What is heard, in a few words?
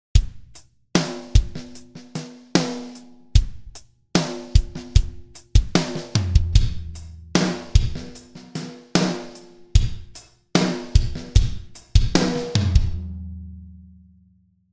Drums Medium Room